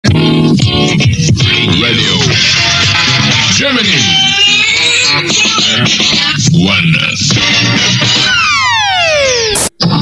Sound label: Jingle; Radio Gemini One